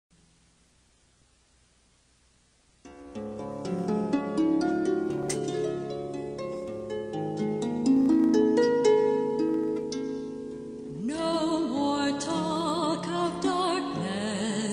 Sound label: harp